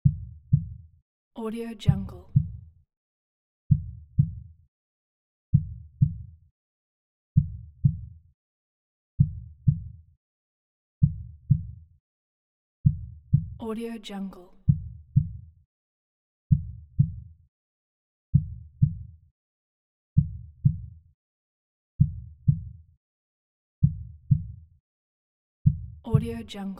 heart beat slow

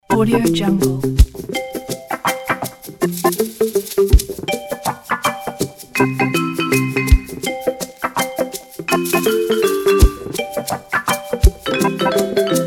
reggae

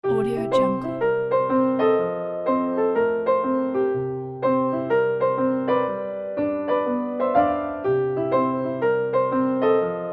ragtime boogie

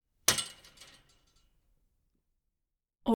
chainlink fence impacts hits metal rattle; prosoundfx

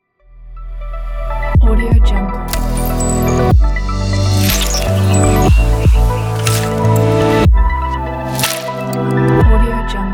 future tech logo

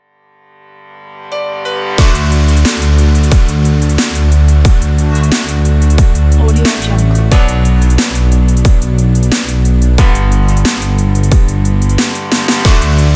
calm and light breakbeat

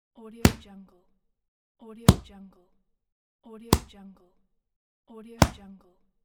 punch kicks

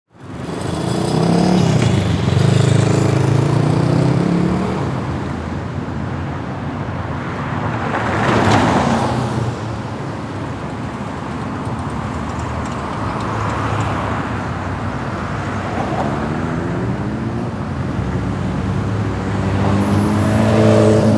city traffic ambience

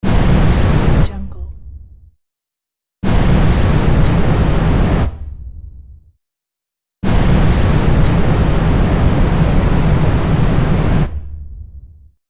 flamethrower; mountainaudio

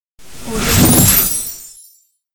epic reward

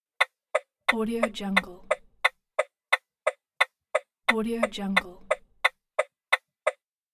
hazard lights

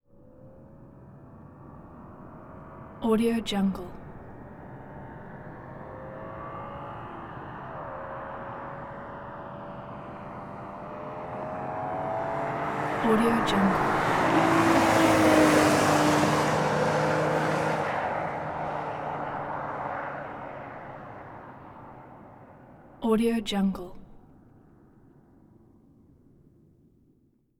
2002 bmw m3 car uphill pass by; prosoundfx

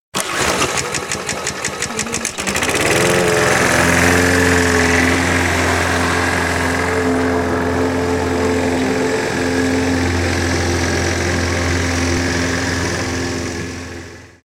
lawn mower and running

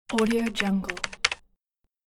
keyboard typing

